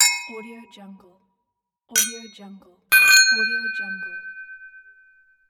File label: clink glasses